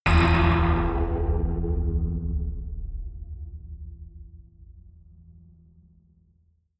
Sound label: bass wiggle short synth; hits explosionen